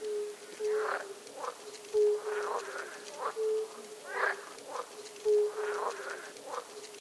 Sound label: Small ambient frogs; STALZONE